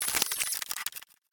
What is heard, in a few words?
robotic robot cyber tech nano micro movement mechanical servo on; pm nanotech; Sonniss GDC